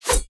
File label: whoosh; Blud Mage; StudioFreresChasseurs